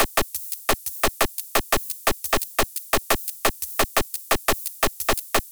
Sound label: chip DnB; flstudio